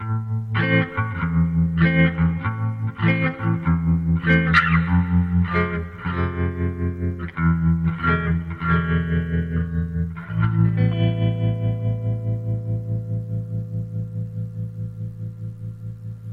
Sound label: Cute Music